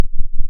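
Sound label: ring modulation tri tri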